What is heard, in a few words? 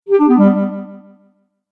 Message Alert, beep